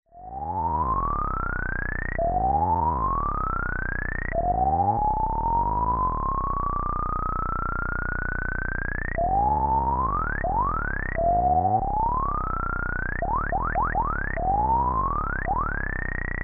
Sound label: Frog Bog; TTSH Patchbook